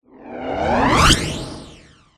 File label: mini dragon